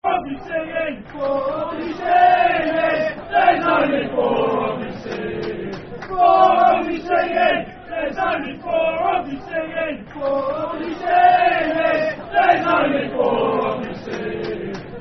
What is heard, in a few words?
Four Of You Singing, Football Chant; Fulham Ffc